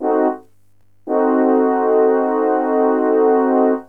DIMIN, MUTE BRASS; cubase; CUBASIS

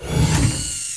SLIDE; FRAGILE

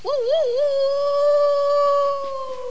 WOLF HOWL

